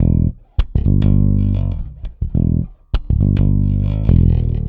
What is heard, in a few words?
Rock, Bass; Bass X